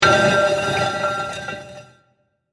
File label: Heavy Mangled Crash Impact; Digital; Impacts; CraftedSignals